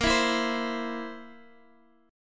A sharp Suspended 2nd Flat 5th A sharp, Guitar DADGAD